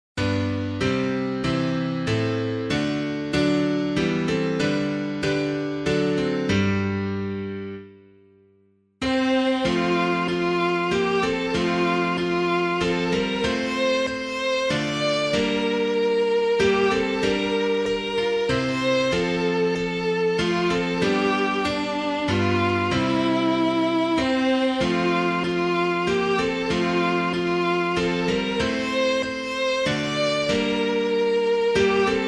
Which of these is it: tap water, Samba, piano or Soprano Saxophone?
piano